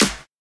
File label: clap sound g key; percussion; single hits one shots